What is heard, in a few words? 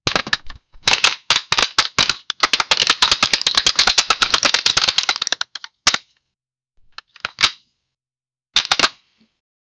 steady clanking armor and; weaponry